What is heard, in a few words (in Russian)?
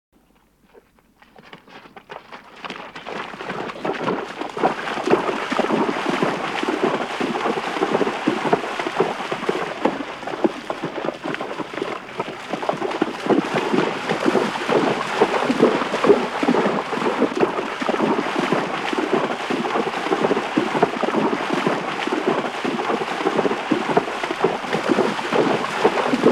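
Переправа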